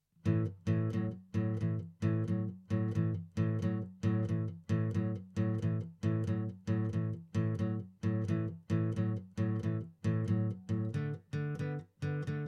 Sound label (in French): shuffle standard